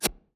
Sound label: boomerang throw